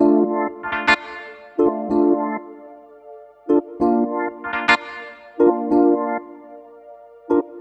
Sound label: Root D 126 BPM; Vengeance Samples; VEH3 Special Sounds